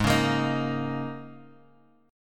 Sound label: G sharp Suspended 2nd Suspended 4th, G sharp, down, Bass Standard; G#sus2sus4; strummed; JGuitar